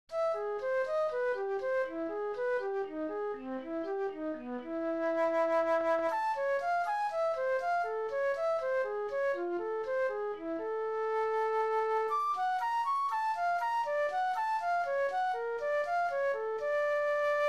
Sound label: Augmented scale study 120bpm